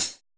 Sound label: HARD CHH 2; R+B KITS2